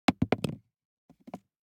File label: DICE on Plastic, Throw and Roll, Foam, 1 One Die; Samples; ArticulatedSounds; DICE Sfx Party (Extended)